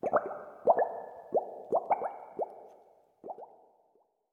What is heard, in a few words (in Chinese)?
气泡柱上升环境声; ambient